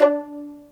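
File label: PIZZ VLN A2, violin; AKAI S-Series CD-ROM Sound Library VOL-2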